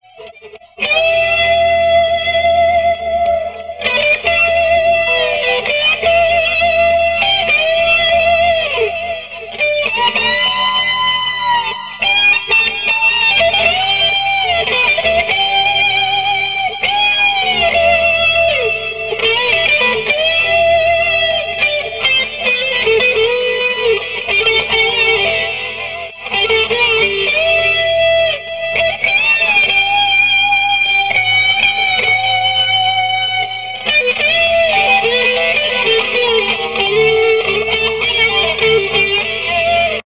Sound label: guitar